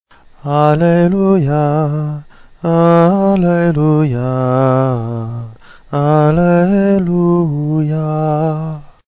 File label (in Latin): Alleluia VI, chant